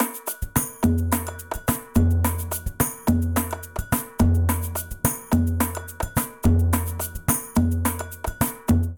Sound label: Afoxe 107bpm, Latin Percussion; Ableton; Factory Packs